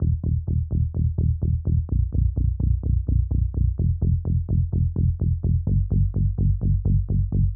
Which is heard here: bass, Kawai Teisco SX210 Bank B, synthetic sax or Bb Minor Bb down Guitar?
bass